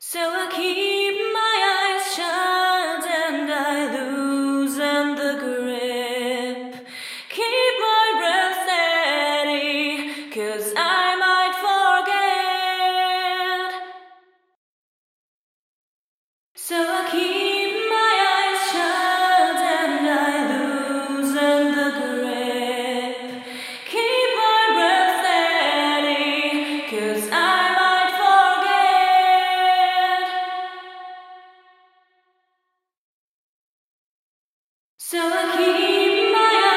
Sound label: voc reverb